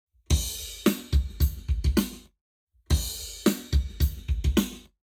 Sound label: Drums, 0to360deg; eBreve; Lab Wet